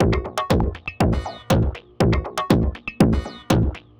Tech Beat 120 fx, techno; musicradar; 120bpm; Beats

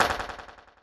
Clap, techno drum

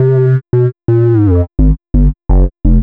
DnB Bass 170 C, drumnbass 170bpm; musicradar; Basslines B

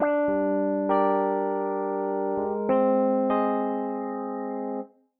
Keys Line 100 G, 100bpm, hiphop; musicradar

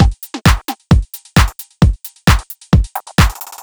Beat 132BPM (Full), techno; techno drum fx

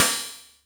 Jomox Cymbal; techno drum fx; Analogue DrumHits

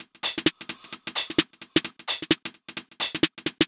Beat 130BPM (Drums No Kick), techno; techno drum fx

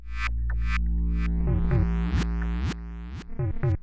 125BPM Synths, techno; drum fx; Beats

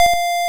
Guitar F2; multi-samples; CHIPSHOP DELUXE

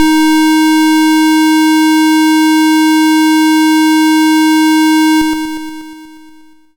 YM Synth D#; SYNTHS; CHIPSHOP DELUXE; CHIPSHOP HITS

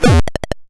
YM Kick, DRUMS

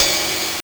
GB Ride, cymbals; DRUMS; ChipShop Deluxe; ChipShop Hits

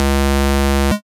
GB Bass C#, chip; chip hits; CHIPSHOP HITS

